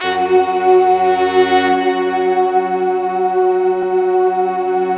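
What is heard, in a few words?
BRASS, Slow Brass Choir; Optical Media International - Sonic Images Library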